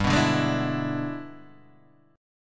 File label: E 7th G, guitar; E7/G strummed; JGuitar